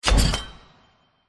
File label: Gain Iron